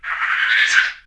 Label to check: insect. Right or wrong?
Right